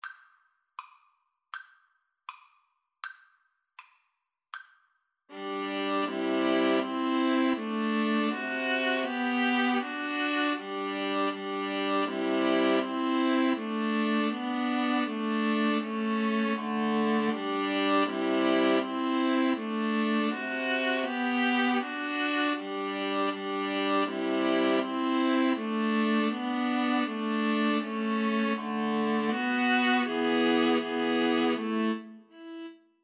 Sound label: Gavotte Cello Suite 6, Viola Trio; Bach